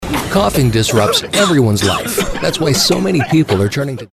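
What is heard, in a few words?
Coughing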